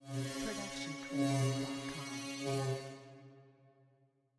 metalic energy; soundscrate

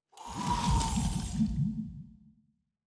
designer scifi mechanical servos; SoundsCrate

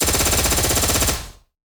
Assault Rifle; SoundsCrate

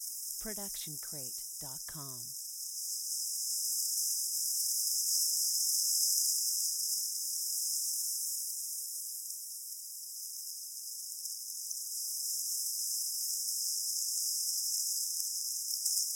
Insects Flying Buzz; SoundsCrate

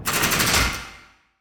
jail door quick; soundscrate

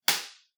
binder snap

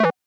graphics game blip; soundscrate

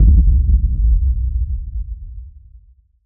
sub shockwave; soundscrate

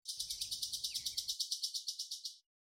bird; SoundsCrate

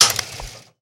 switch; soundscrate

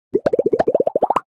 Stylized Bubble Pops Ascending; SoundsCrate

